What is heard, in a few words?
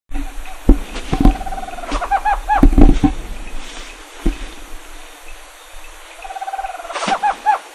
Megascops choliba; PN El Palmar